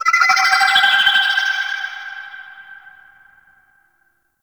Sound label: Mine Drone Underwater Vocal; Warframe; Grineer